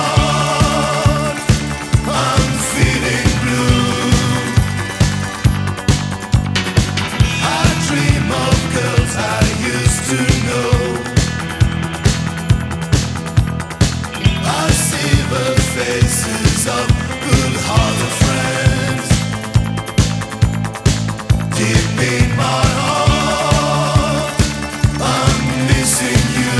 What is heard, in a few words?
disco